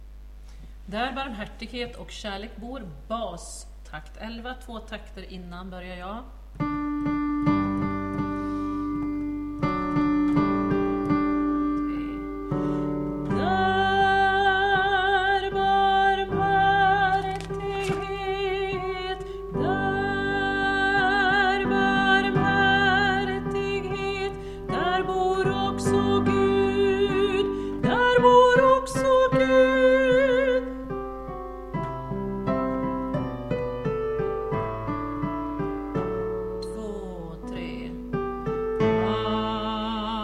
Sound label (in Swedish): bas; Dar barmhartighet